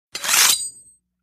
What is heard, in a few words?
Ancient Sword Draw